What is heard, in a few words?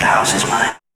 Vocal Phrases; Vengeance Samples; Vocals